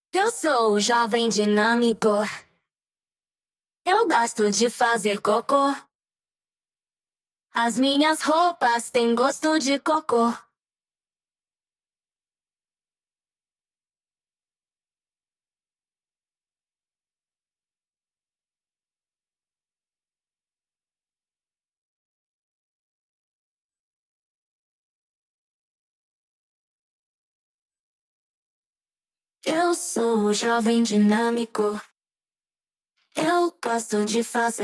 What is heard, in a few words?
Vocals